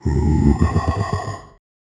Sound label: vocals